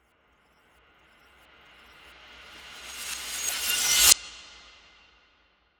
CINEMATIC RISER; GROUND BREAKER

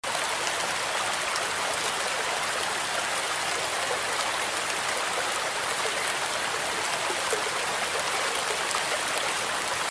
rain sfx 77bpm D minor

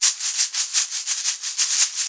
long shaker, 117bpm, B major

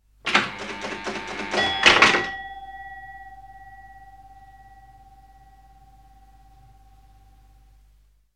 electronic; bbc

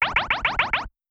squeaky lazer 103bpm A# minor

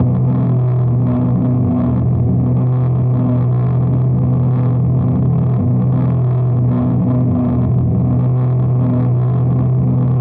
dark scary ambient noise, 78bpm, B major

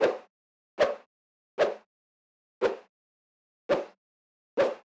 quick swoosh

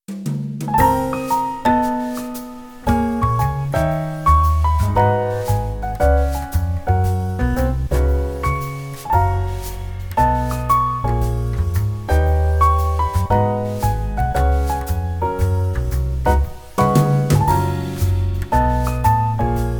cafe music

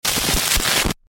digital glitch noise

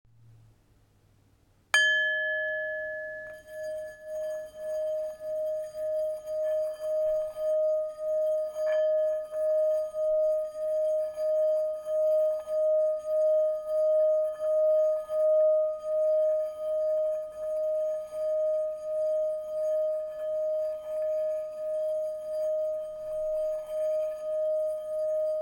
soundbowl

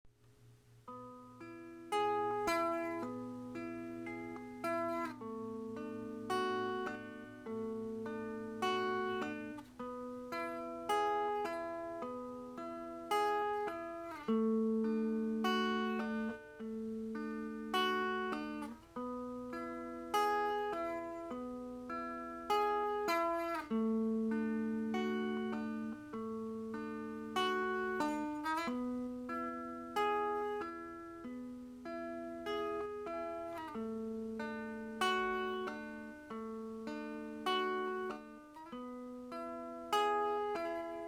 dreamy

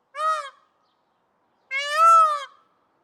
birds fowl bird peafowl

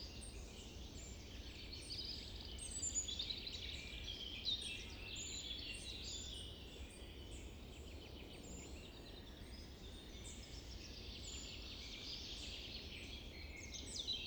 forest birds

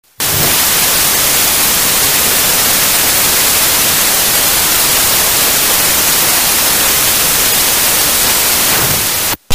laughter with applause

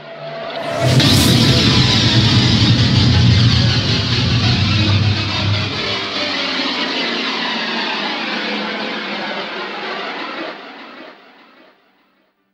Loud warning

